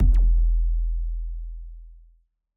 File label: Impact; 344 Audio; Epic Impacts Vol. 1